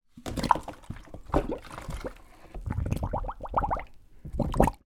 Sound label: plastic bottle submerge kitchen sink bowl water bubbles